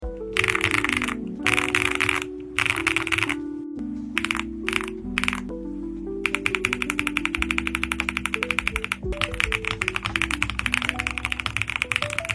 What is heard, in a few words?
Akko V5 Creamy Blue Pro Switch, keyboard; M1 V5